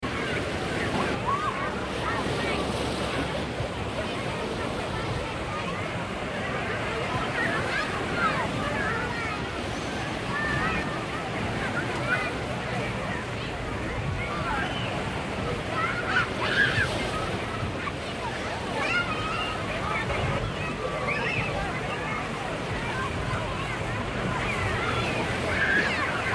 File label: atmo waves surf and crowd